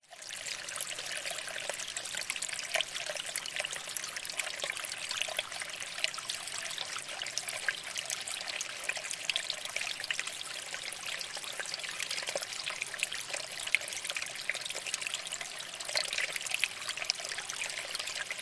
waterfall water run trickle down rock face; zapsplat

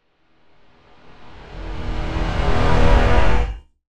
Swell Musical; bottlerocket

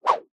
cartoon swipe fast swish; zapsplat

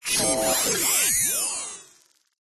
science fiction machine or robot glitch

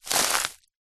footstep single grass thick dry; foley; zapsplat

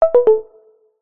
notification tone soft subtle

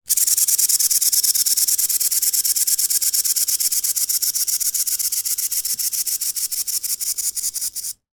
toy wind up fish release fin shake

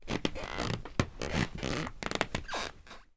foley footsteps creaky attic floor

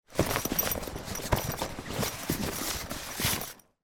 rucksack rummaging inside; foley

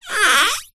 office plastic squeak stretch adhesive tape

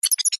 science fiction robotic insect critter chirp; zapsplat

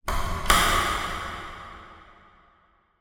industrial door lock reverberant

